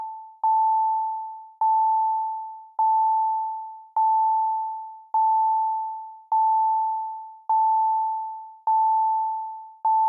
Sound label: Ping